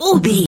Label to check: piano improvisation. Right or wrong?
Wrong